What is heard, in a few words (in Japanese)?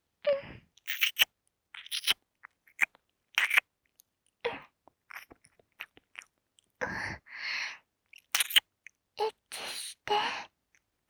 エッチしよ 耳舐め喘ぎ; ハーレムオナサポ 4人のメイドがご主人様のオナニーをお手伝いするバイノーラル～文、千曜、名月、莉桜～